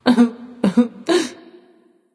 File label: boohoo; smite; voicepack; medusa